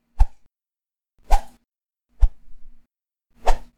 Whoosh

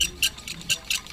Swing Loop

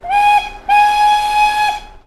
Steam Whistle

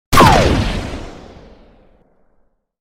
Laser Shot Gun Sound

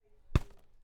Punch

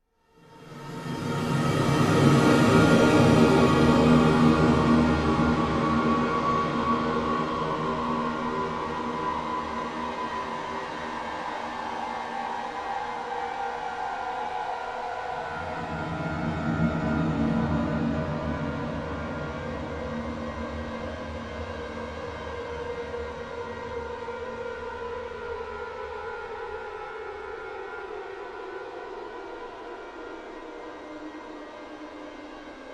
Unsettling Epic Horror Title Intro

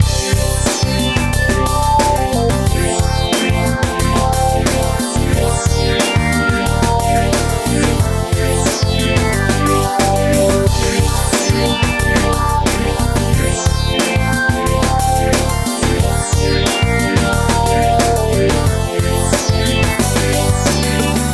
Loop

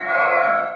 Howling Sound

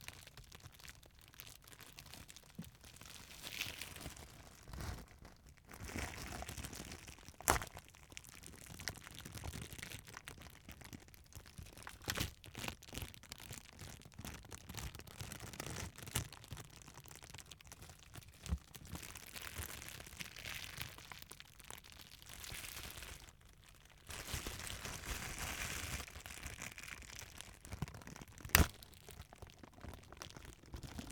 Plastic Bag For Sandwiches Rip Stretch And Play

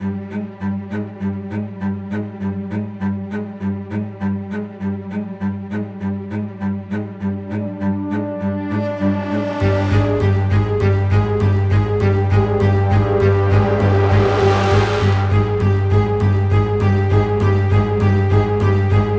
Scary Orchestral

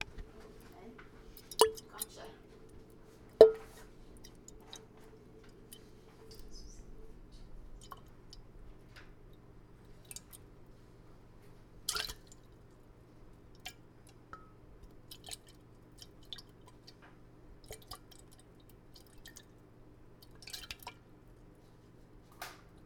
Water Sloshing In Klean Kanteen

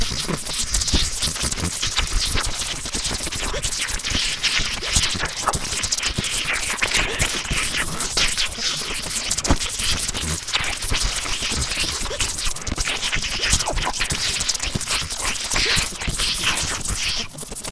Electricity Crackling With Hight Pitch

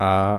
Vocal A

